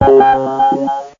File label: talk